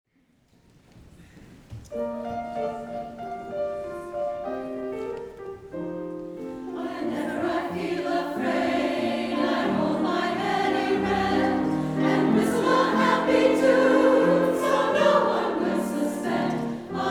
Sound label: choir